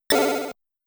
Space Bee Hit Sound; Motos

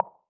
tile

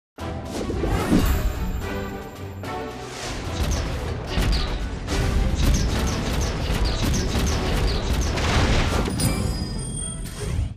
Triple Cannon; Disney Infinity

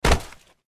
Body Falls Floor

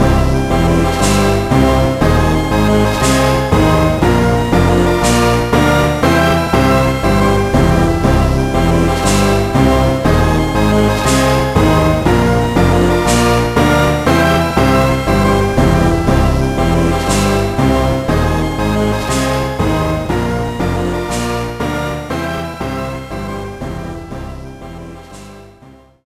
Perfect Rating; santa claus junior gba gamerip 2002

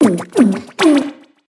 windup; Froster

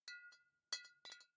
Bottle Shake; Devour; The Town; devour 2021 gamerip pc macos

